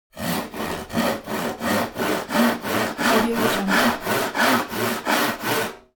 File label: foley wood sawing; smartsoundfx